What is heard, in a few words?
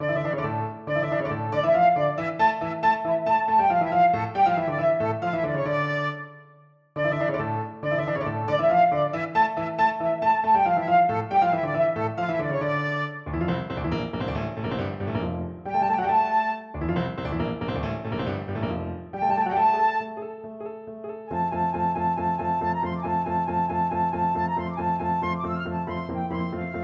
flute